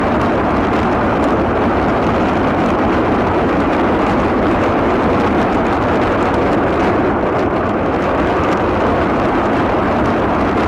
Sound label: rocket, weapons rpg